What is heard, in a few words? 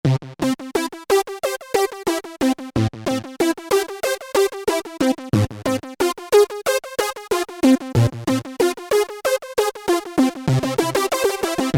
Shortsawz